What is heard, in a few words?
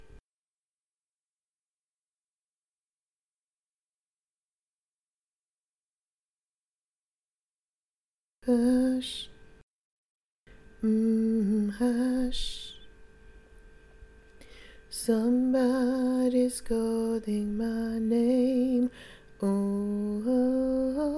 tenor